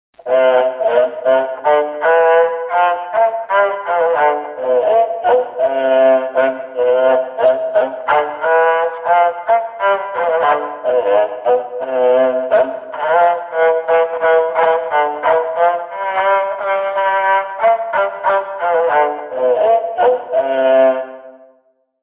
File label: rabab